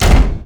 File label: Run; ArkAstan; Gamigo